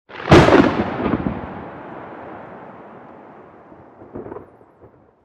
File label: very close, nature; Atmospherics